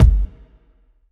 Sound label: large room kick b key, Royality free kick sound tuned to the B note